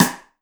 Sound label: snare rim, rock drum kit